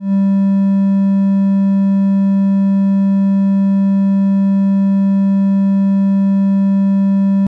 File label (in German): dreieck; harmonie